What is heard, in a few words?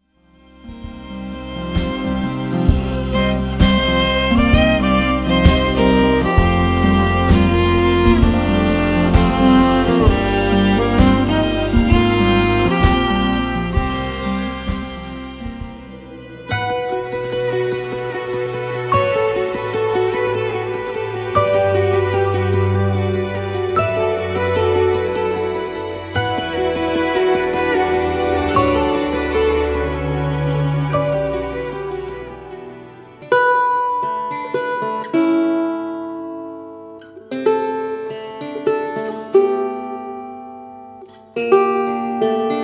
Folk Underscores